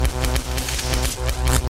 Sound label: Loop Lightning